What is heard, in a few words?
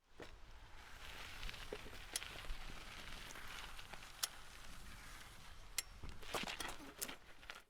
falling off bike on gravel